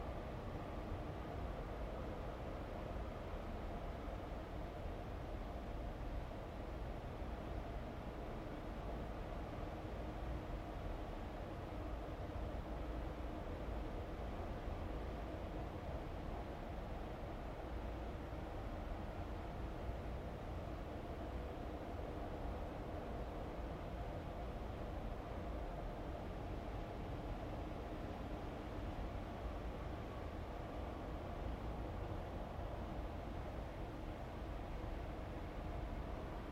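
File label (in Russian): далёкий лёгкий длительный звук работы производства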